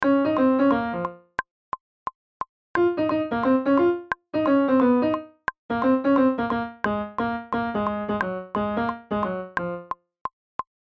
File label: sax